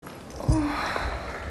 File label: urgh